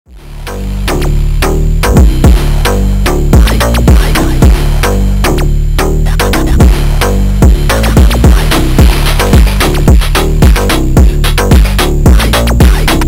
krushed PHONK

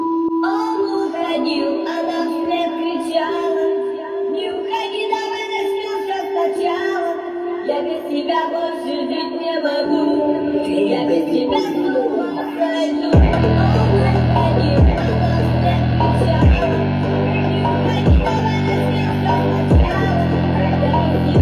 Brazilian Phonk

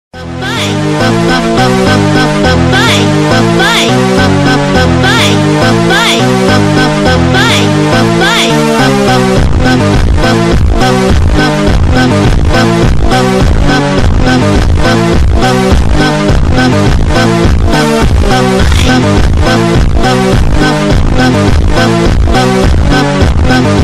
slowed remix

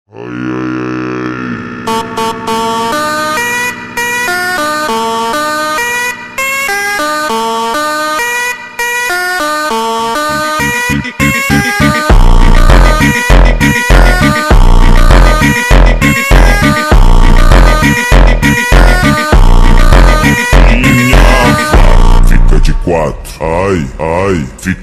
super slowed brazilian phonk

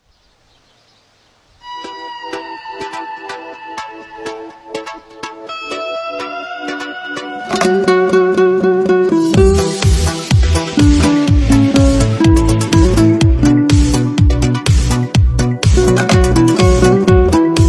Karaoke Dombyra

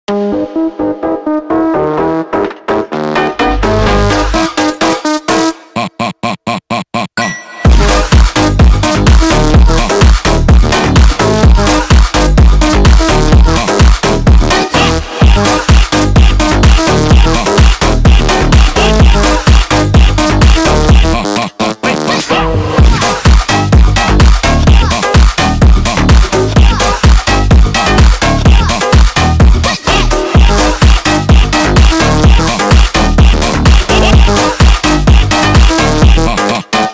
Phonk

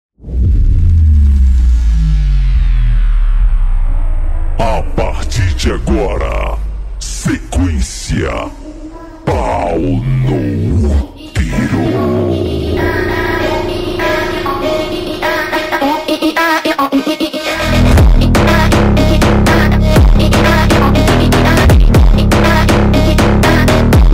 Brazilian phonk

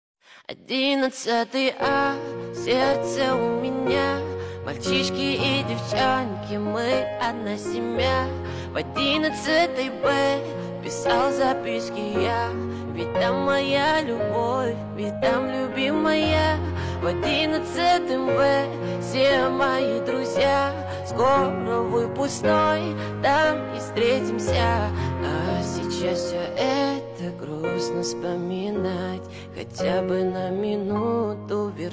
Piano version

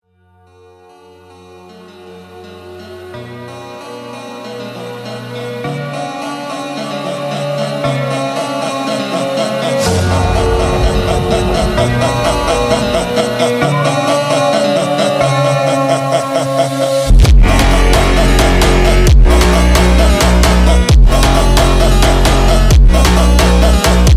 Speed up BRAZILIAN PHONK

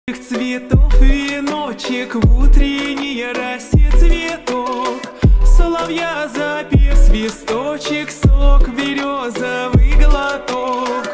Remix slowed reverb

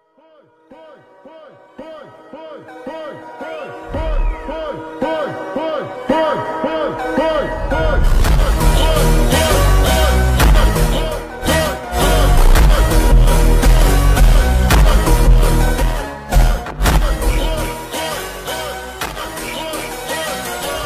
slowed brazilian phonk

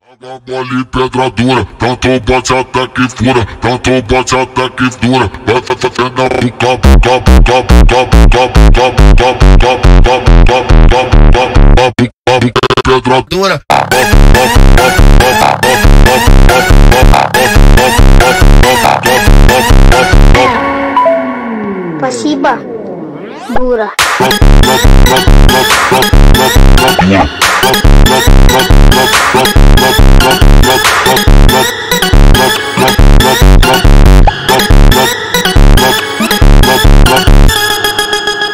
Funk Phonk

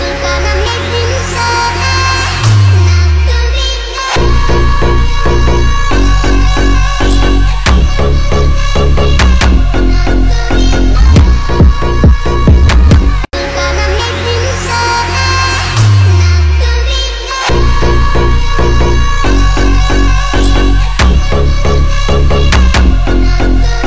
Phonk remix

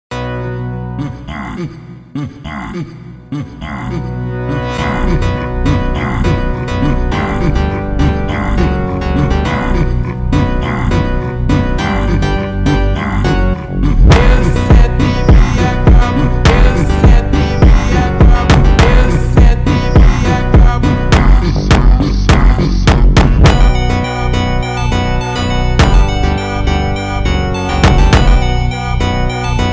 slowed Brazilian Phonk

